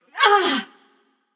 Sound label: grunt hit passion; chaac